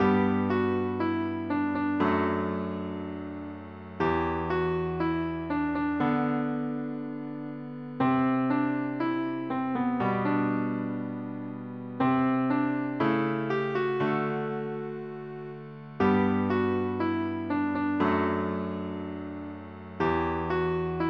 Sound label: Can You See the Trees piano fast; fast tempo; PlayScore version